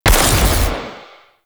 Portal Beam Fire Alt; Enemies; Abilities; Warframe; Zariman; Void Angel

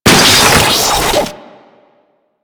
Auto Turret Agent Close; Warframe; Venus Turrets

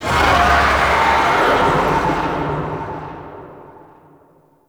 Distant Oni Vocal

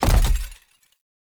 Step; Warframe; Grendel; Grendel Deluxe

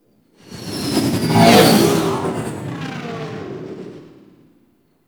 Fighter Ship Close Fly By; Warframe; RailJack; Corpus; Space Fighter Crp Ship Engine A

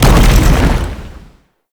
Hammer Shockwave; Ultimate Abilities; Unairu; Warframe; Focus

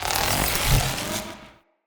Galvanic Button Power Up

